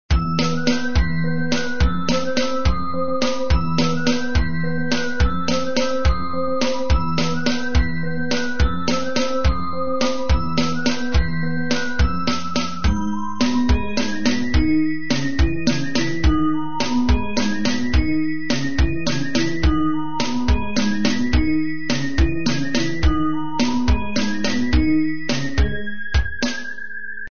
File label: Loop Slow; Trick or Treat Smash Online; Windows